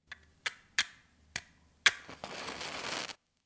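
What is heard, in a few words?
match sound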